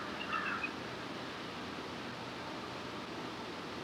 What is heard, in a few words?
Crows & Eagle; Electa Quinney